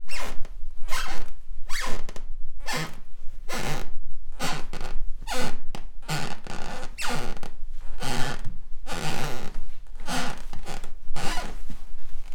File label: Squeaky floor